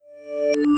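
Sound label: reverse, Variations on a ringtone; Reversed audio; tri-tone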